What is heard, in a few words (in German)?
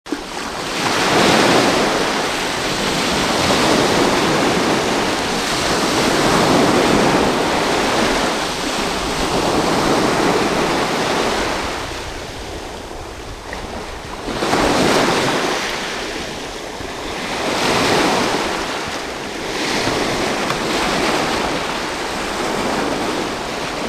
Meeresrauschen